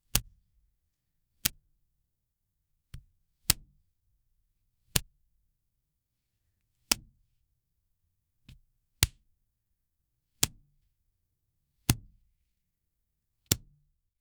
close the helmet visor

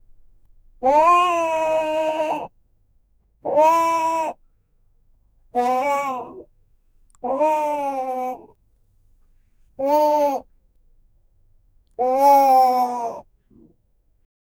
meow meow meow by human; human vocalizations

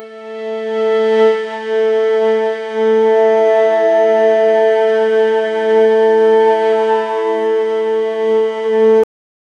turkish strings one note

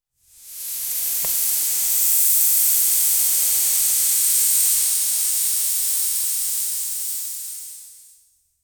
money; a crisp detailed soundsca

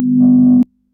short error buzz soft wro; negative feedback

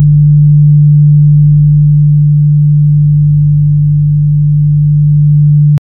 a deep electric hum

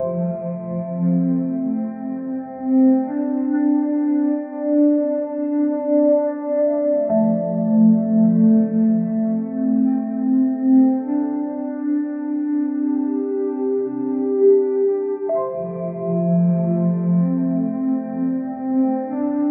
style titl, smooth end

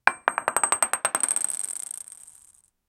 the sound of many, mahjong

